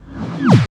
boing; Spectrasonic Distorted Reality 2; BEMUSED

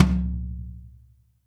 TOM H4 LOU R; Zero-G; Chemical Beats

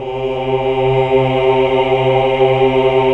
HAL AAH, GREG. AAAH Uni; Sound & Vision - Gigapack II CD 1 (Roland)

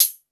sfx perc

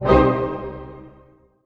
ORCH HITS MIN, C MIN PP; Sound On Sound - Peter Siedleczek's Orchestra

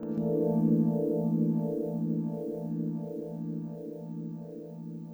EP, Pads; Sonic Foundry (Sony Creative Software); Synchro Funk

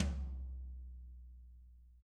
DW HIT MPD, Double Platinum Drums; ILIO

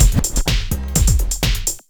Beat, Drums & Percussion; Electrocution; Beats

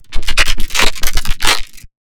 Low Frequency Experiments

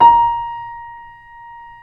KEY, YC7 Piano pp; Roland L-CD701; pp YC7 St

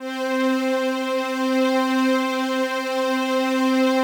PAD, DIGI-PAD

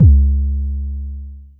808 C#2, Kicks; Drums; MBase 11 - 808

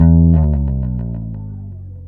BASSES, Roland

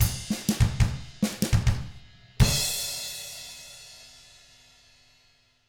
FUNKY, Drums

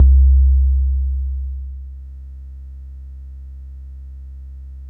SUB C3, SUB BASS; Explosive Jungle; USB Soundscan vol.05; AKAI

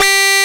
SYNC WAH G3; Jupiter Synths; E-MU Formula 4000 Series Vol. 3; Analog Odyssey

